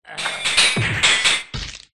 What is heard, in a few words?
militia; c-evo